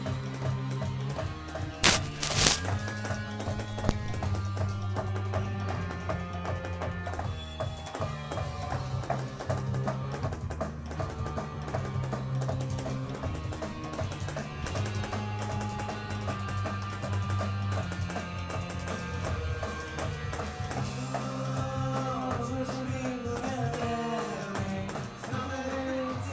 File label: rockband